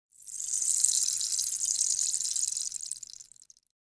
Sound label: shower tablet